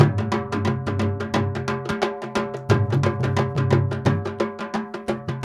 INDIA R, IND ENS PH, Heart of Asia

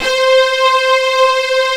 MIDI STR, SYNTH STRING; Greytsounds VOL-1

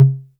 tom, Korg ER-1; 300 Drum Machines; Kit 6

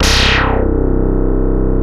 OSCAR C1, synthesizer; Hollywood Edge - Giorgio Moroder Rare Synthesizer Collection

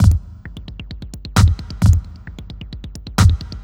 Drums Strange

